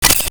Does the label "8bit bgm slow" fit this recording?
No